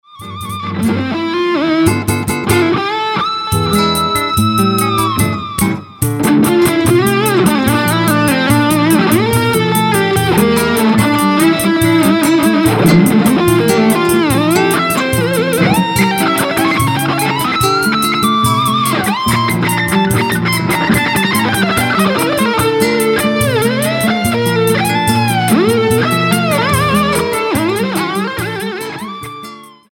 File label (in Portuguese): Chorinho Metal